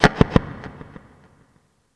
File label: chug, Synth